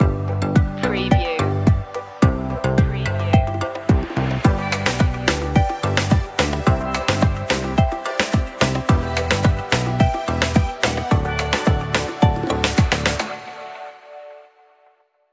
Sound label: tropical fun